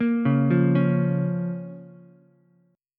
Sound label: Db 6th, Ukulele; Db6 arpeggiated; JGuitar